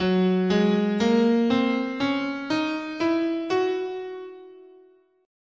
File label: F sharp Lydian Dominant, scale